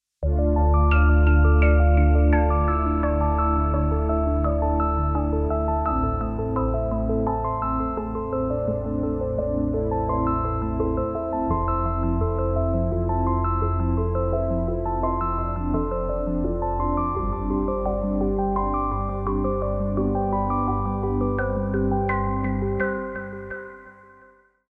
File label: Pad; Juno X; Celestass Pad